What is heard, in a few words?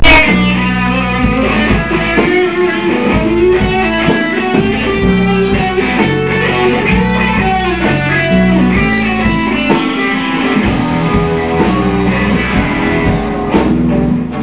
solo